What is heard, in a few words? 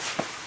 cloth; hl2